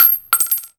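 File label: shell bounce small, weapons, shells; ModernWarfare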